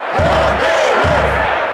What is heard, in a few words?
Cheer German; Jigglypuff; SSB